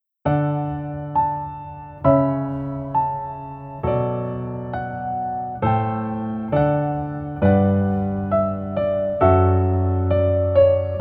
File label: Piano